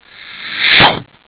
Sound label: swish; deliantra